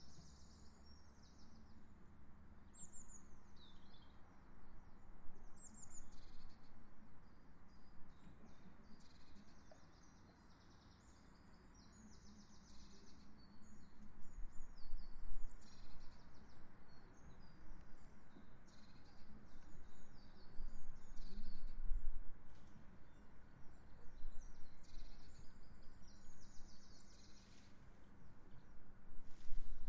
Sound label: birdsong, Includes birds, clock striking 1; one o clock; partner not realising i was booing